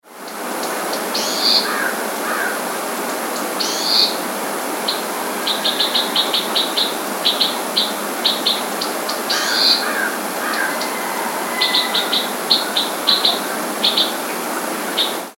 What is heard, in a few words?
Bewick's Wren buzzes & chips; FAMILY TROGLODYTIDAE; A bubbly series of chips. Sometime given intermittent with the buzz call (a)